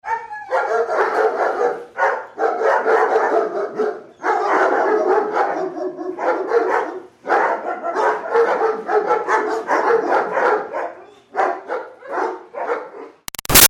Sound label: Dogs German Shepherd Kennel Barking Excited; Animals; Big Room Sound